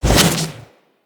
Melee Jump Slam Short Charge; Whooshes

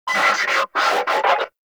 Exalted Sniper Cast Vocal; Frumentarius

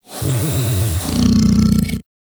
Vocal Breathe Slow

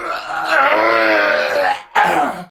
Death; Warframe; Tau; Dax; Ashigaru

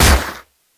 Akimbo Pistol Shot; Grineer; Weapons

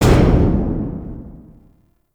Railjack Weapon One Hull Mech; Warframe; RailJack Weapons; Modular Weapons

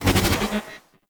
Gun Beam End; Warframe; Weapons; Thano Tech Arch Gun

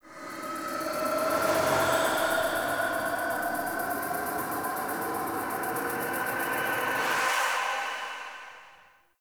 Pads; Warframe; Cinematics; Emissary Season Two; Zeal Pre Intro

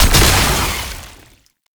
Techrot Conjunction Explosion; Gameplay; Warframe; Nineteeen Ninety Nine; Deep Archimedia

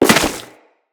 Crewman Step Dirt, Footsteps; Enemies; Corpus

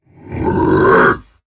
Attack Effort; Warframe; Dax Heavy; Battle Barks

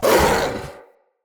Corrupted Battle Dog Pain, Animals, Vocals

